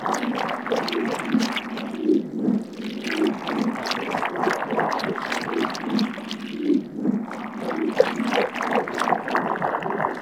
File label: Infested Eris Interior Caves Vein Loop; Warframe